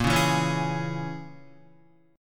A sharp Minor 7th Flat 5th A sharp, Guitar 4F,4A,5C,5G,5C,6E; strummed